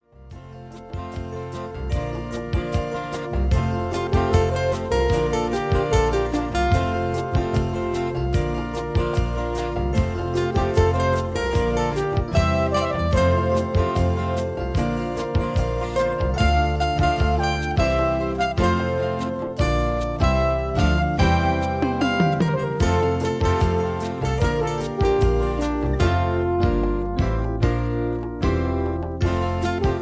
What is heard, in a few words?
GENTLE BG